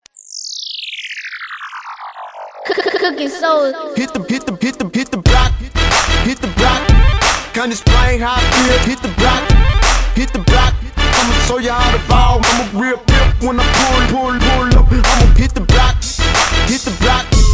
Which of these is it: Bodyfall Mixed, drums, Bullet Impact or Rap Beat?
Rap Beat